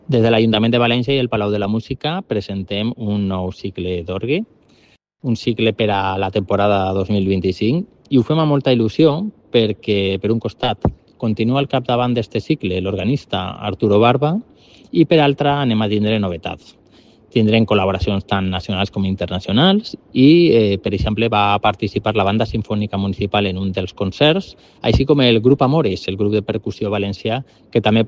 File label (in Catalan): Orgue; Cicle d Orgue